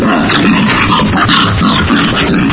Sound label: confused, antlion guard; Source Engine; video game; npc